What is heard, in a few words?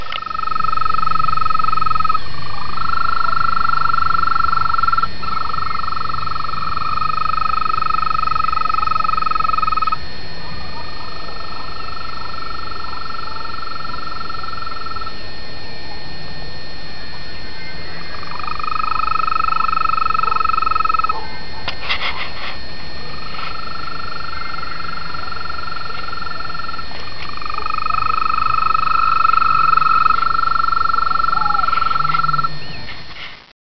frog